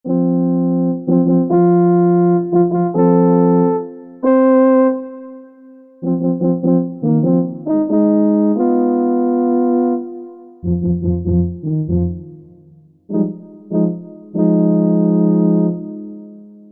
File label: French Horn, Korg MSC-11S Brass